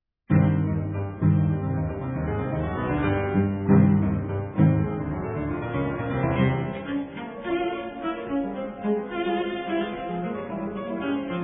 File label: cello and piano; BOHUSLAV MARTINU; Sonata No 2